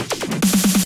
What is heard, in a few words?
Percussion Fill